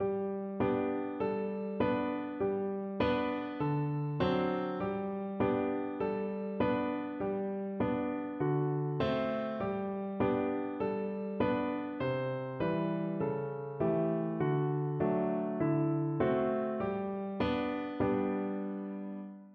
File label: yankeedoodle, piano